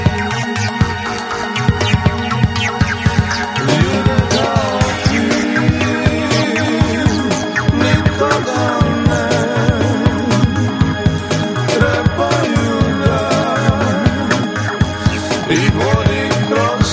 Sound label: arpeggio